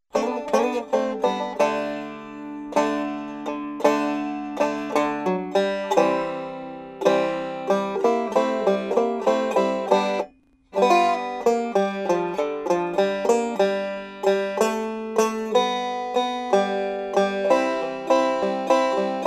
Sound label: g blues